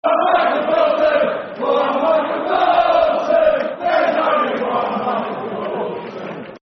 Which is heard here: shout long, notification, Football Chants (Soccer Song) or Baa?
Football Chants (Soccer Song)